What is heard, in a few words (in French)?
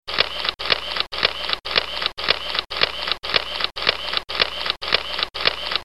appareil photo